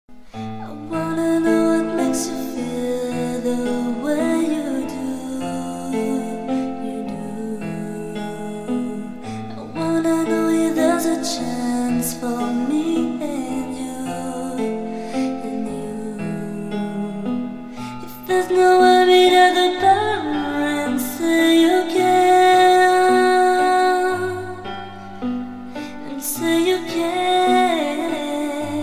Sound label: Nice Piano Voice